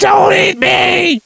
Gore Death, Ginger

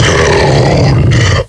Pound Threaten

